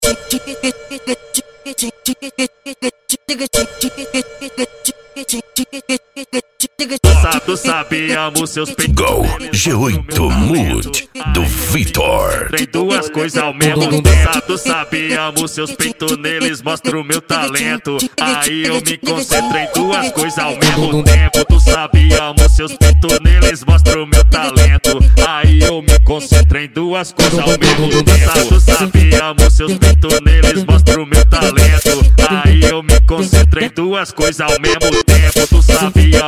funk ritmado

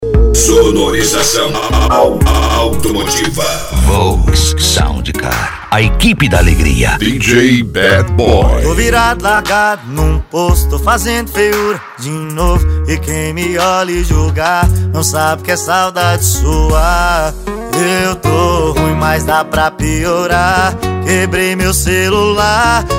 sertanejo e pisadinha; cd volks sound car

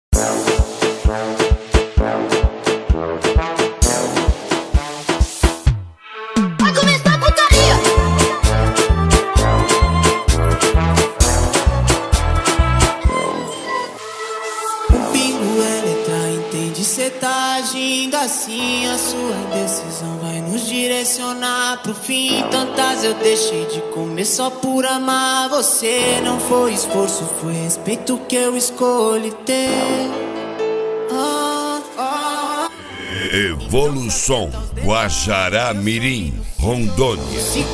noia dance

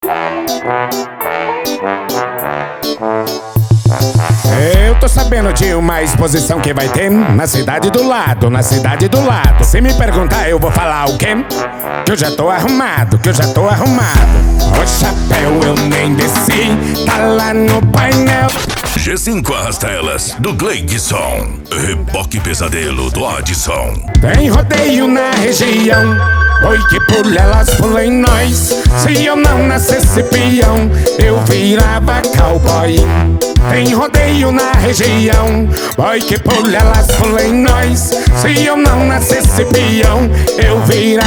sertanejo e forro

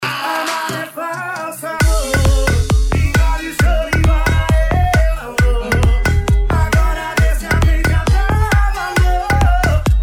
eletro e viola especial de eletro funk

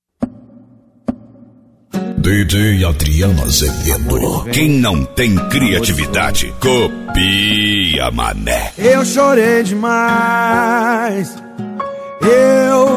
funk e sertanejo

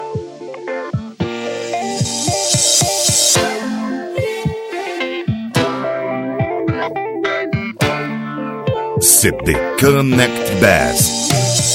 bass house e rap

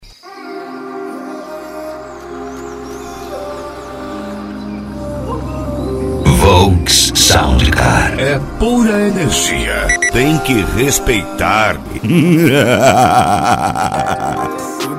sound car hip hop nacional; cd volks sound car

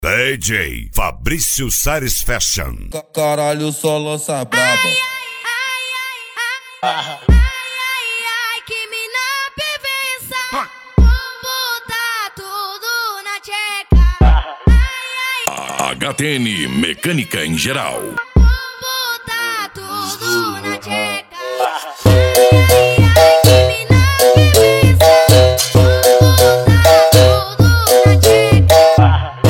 funk e lambadao

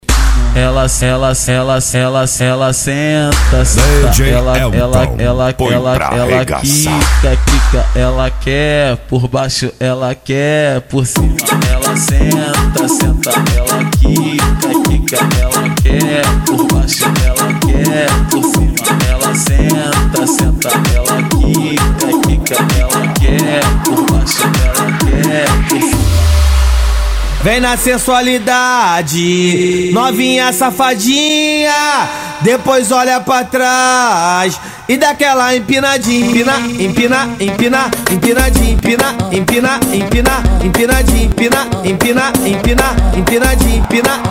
eletro car automotivo

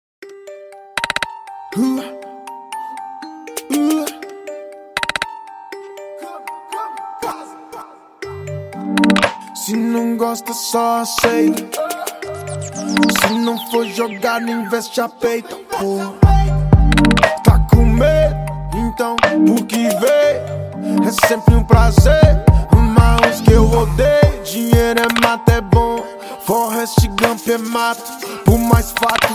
rap; cd rap flash sound

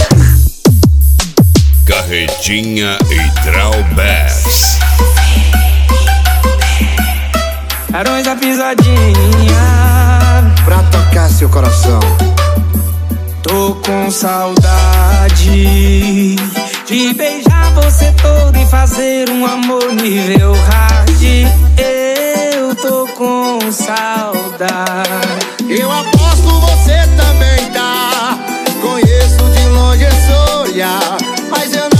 piseiro

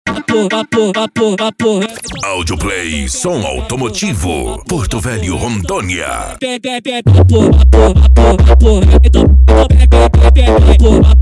esp funk mega bass

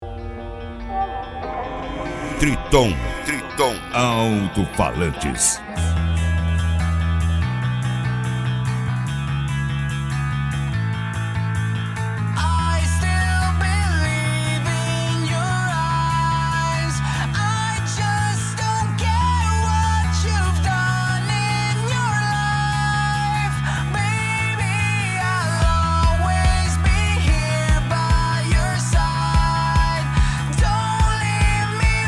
triton bass, Alto Falantes Especial Bass

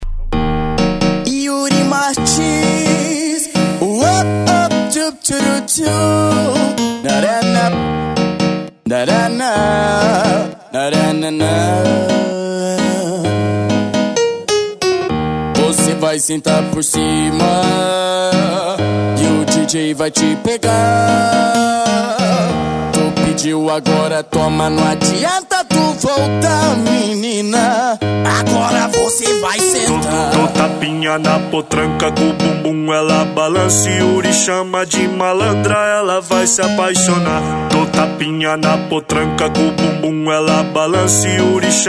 funk evolution audio car

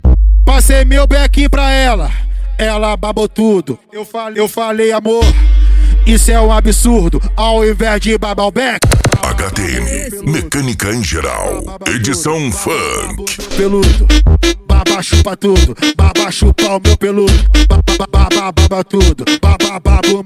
mecanica em geral edicao funk

